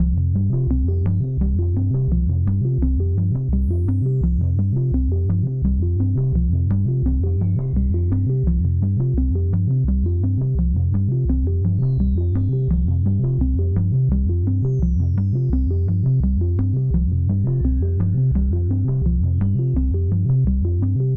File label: Bubble Loop; Jackbox Games; The Jackbox Party Pack 5; YDKJ; Binjpipe